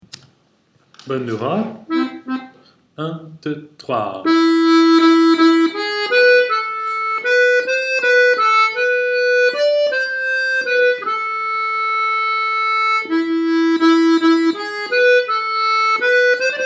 Bandura pour accords, accordeon; Morceaux mélodie seule

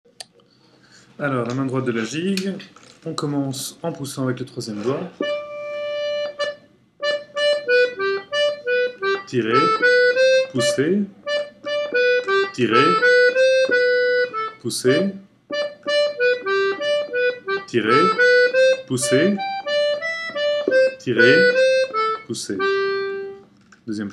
Gigue MD, accordeon; The Atoll Highlander

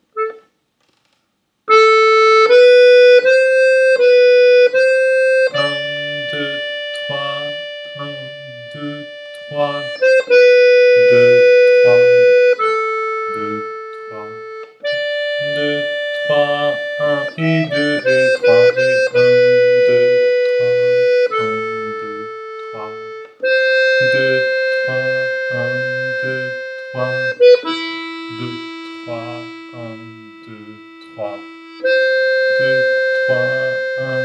Valse MD, accordeon

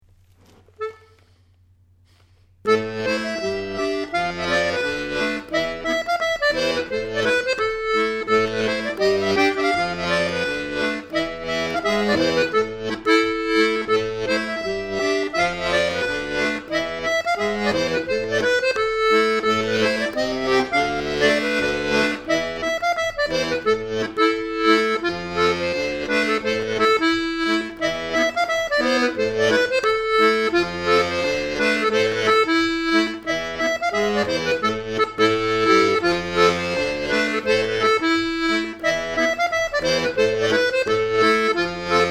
Scottish, accordeon; Scottish du Regret

